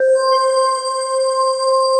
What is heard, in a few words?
Synthesizer Middle C Notes, Lead